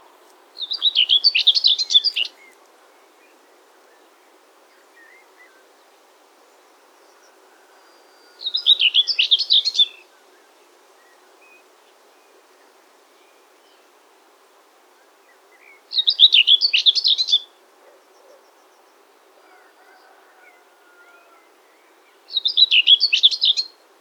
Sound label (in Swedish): Tornsangare